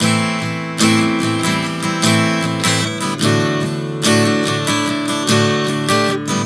Progression 074 Am7-D, Acoustic Guitar, Chord Progressions 074 bpm; Sonic Foundry; Songwriter Acoustic Guitar Companion